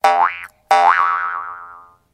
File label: Spring, ringtones; Huawei C8813D